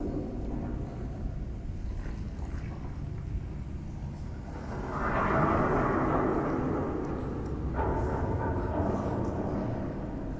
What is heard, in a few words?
Ambient Prison Bathroom; silent hill homecoming 2008 gamerip pc ps3 x360